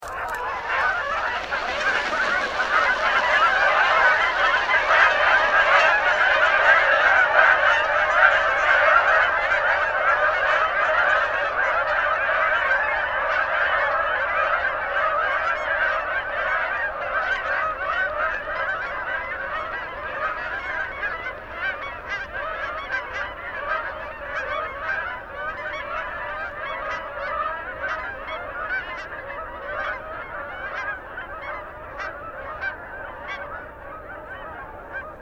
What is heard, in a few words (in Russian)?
kanadskaya kazarka golos